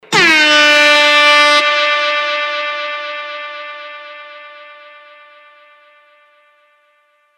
SFX AIRHORN

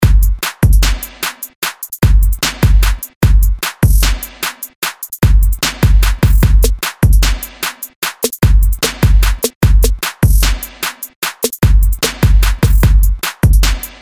DRUM LOOP HIP HOP 150BPM

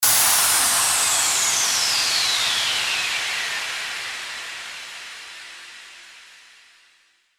WHOOSH

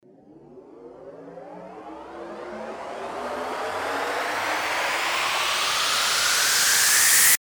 RISER

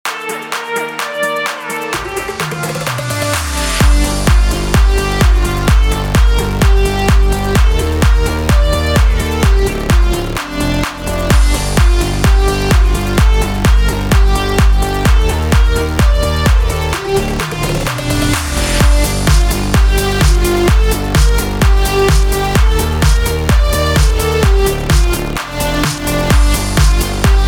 PROGRESSIVE HOUSE GMIN 128BPM; BED

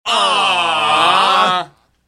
AWW GROUP

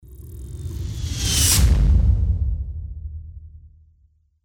IMPACT

